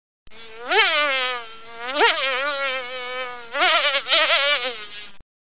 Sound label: bug sound; How Many Bugs in a Box